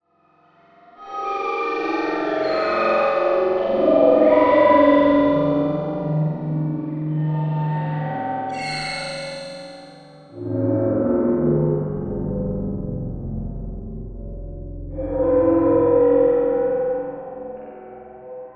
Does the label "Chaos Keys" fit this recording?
Yes